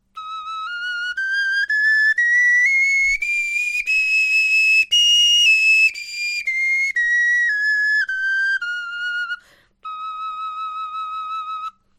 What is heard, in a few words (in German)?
tonleiter, knochenfloeten